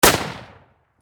Warfare Rifle M1 Garand Semi Single Shot Close Perspective; Rifle Sound Effects; SFX Bible 6